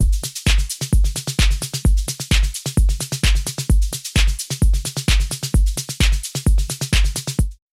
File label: The drum beat; Tech House; House鼓Loop; 四小节